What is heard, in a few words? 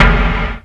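electronic lo snare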